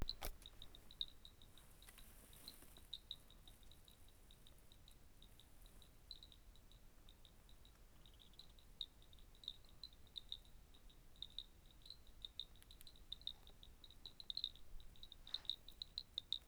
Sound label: Boana cipoensis; Serra do Cipo; Alto Palacio